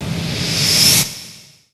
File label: fx reverse; Ueberschall; Techno Trance Essentials